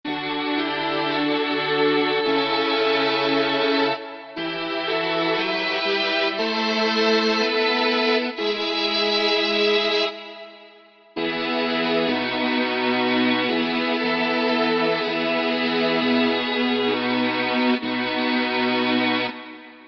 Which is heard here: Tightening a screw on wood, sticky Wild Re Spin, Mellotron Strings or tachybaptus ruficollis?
Mellotron Strings